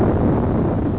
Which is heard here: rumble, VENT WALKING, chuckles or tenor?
rumble